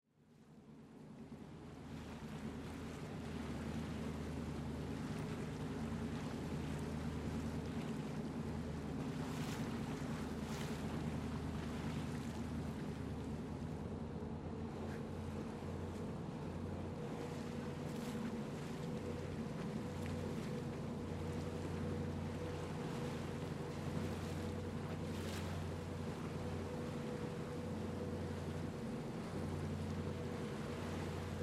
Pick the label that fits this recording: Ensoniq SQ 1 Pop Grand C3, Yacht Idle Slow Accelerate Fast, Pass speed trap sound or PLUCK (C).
Yacht Idle Slow Accelerate Fast